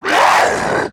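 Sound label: attack, zombie male; nmr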